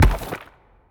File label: symbol Landed; asgardian stones